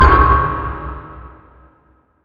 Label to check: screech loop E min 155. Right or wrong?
Wrong